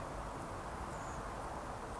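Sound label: Redwing, AudioMoth in bag; nocmig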